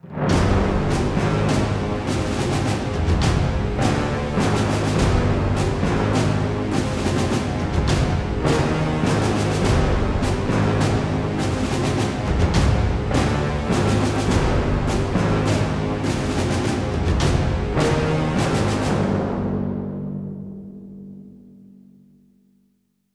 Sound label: Story Beats Serious Intro